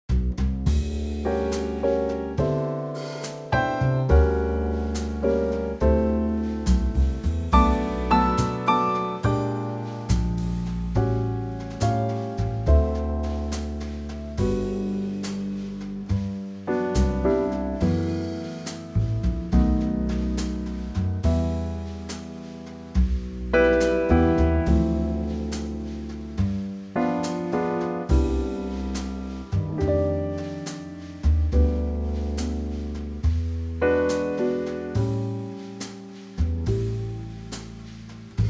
G Trio

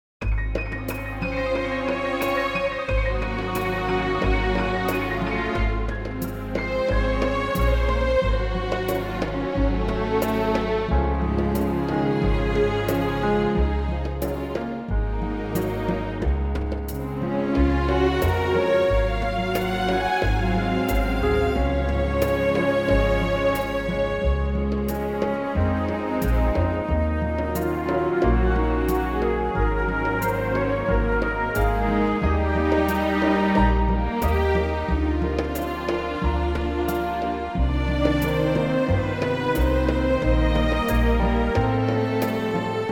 orchestral Db

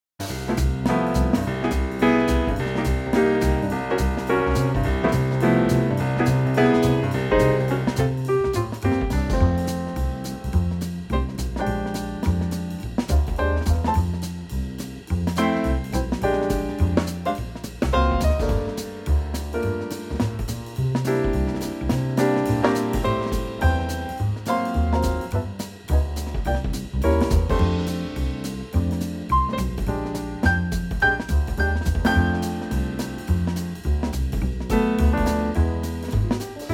F Trio

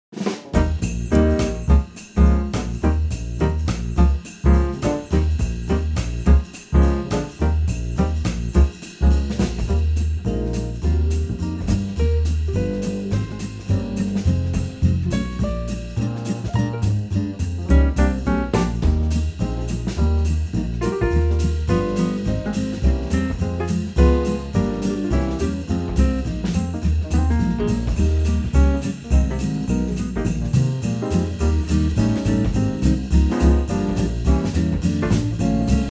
Quartet Eb, christmas tracks

